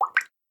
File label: Drip, notifications; Lenovo; ZUI 13